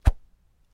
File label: Baseball Arm Cloth W; baseball fx & games